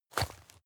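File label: step dirt heavy boots hard, footsteps; schritte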